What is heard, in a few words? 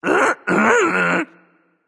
tongue, voicepack; smite; jorm